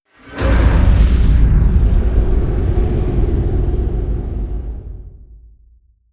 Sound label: distance assimilate hit layer; apocalypse